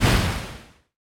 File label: damage hits explosions; World Of Warships